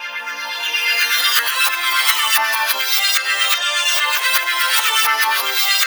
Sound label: INDIA ROCK, INDIAN ROCK; Spectrasonic Distorted Reality 1